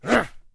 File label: horseman attack; propack